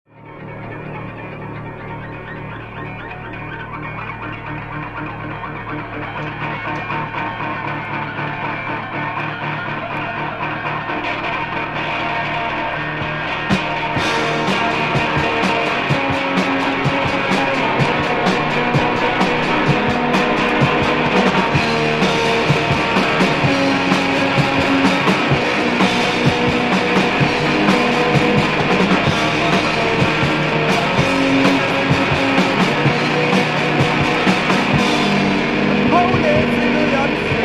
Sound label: wave no wave